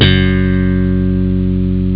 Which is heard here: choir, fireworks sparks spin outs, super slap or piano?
super slap